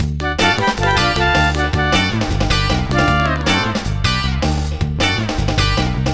Flutes & Swingers Full D; Zero-G Kocktail Kollection; Construction Kitz (Lounge); Flutes & Swingers 156

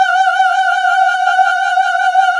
GOSP. AAAHH; Sound & Vision - Gigapack II CD 1 (Roland); HAL Gosp. Aaah 2

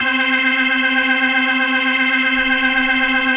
C4F, B3, Vintage Keyboards

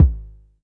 Polite kicks long G#1; LIMAT Div Dept; Drums

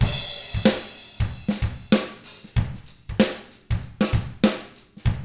95 FUNK, Drums; ILIO; Double Platinum Drums 1

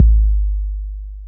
TR-808 Kick, Roland TR-808

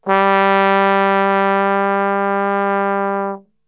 G 1 Brass Super Section; Best Service; TRMBSTRGHT; 90 s Sample CDs

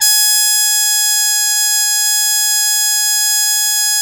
MUTE G#2, Mood Trumpet; E-MU Formula 4000 Series Vol. 1; Hip Hop Nation

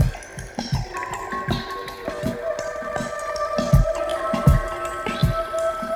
Ambi Groove

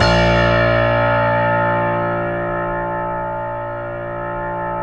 StereoGrand, Keyboards

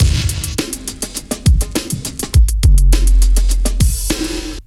RAVE

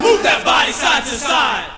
VOX SUNG; AMG Megabass REMIX! VOL-2

